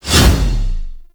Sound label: Proj Throw; Hoplite Army; Warframe; Warframes